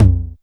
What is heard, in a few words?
HT909, TR909